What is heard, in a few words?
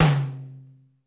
ROOM T, ROOM KITS; Crypton - DrumBase5000